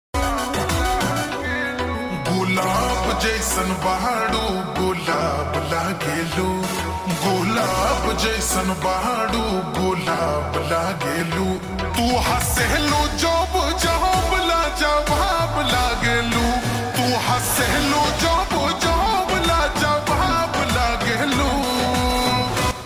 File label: Ringtone slowed and reverb